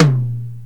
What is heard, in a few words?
high floor tr 707 synth tom sample c key